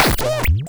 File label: Cwejman S1 - Mechanical Arm